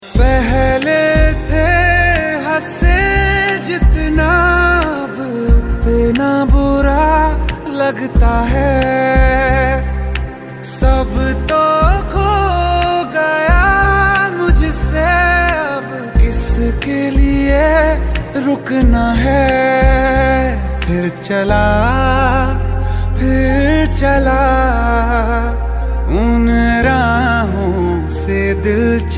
Ringtone

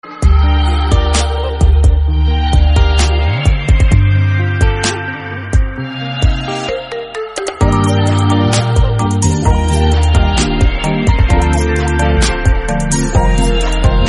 Instrumental Ringtone

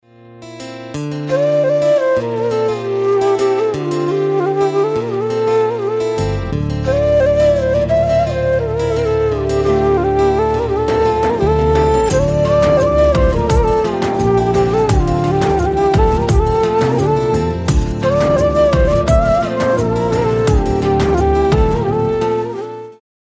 sad melody

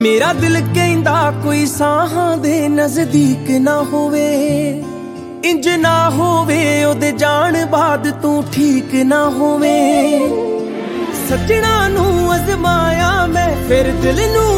Ringtone

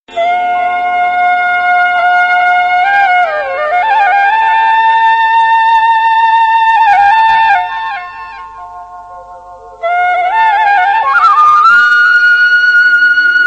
flute music

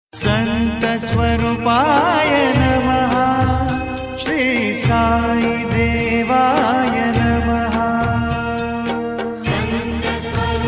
Sai Mantra Ringtone

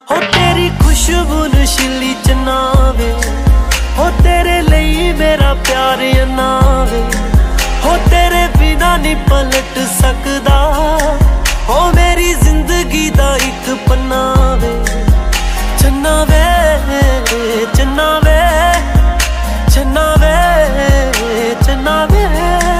Ringtone

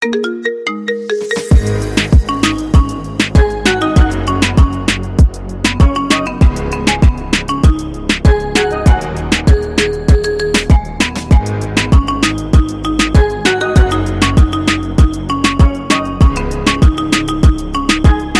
Marimba Ringtones

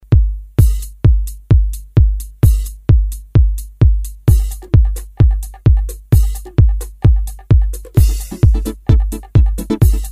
beat alarm

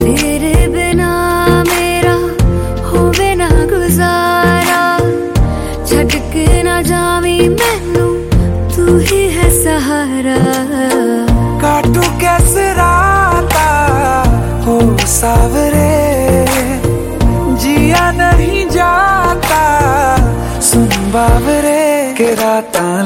Ringtone

